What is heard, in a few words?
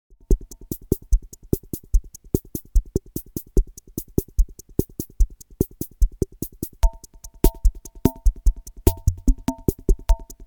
rhythm80 var bossa